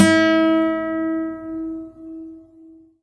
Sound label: string; halflife; dm leveldirector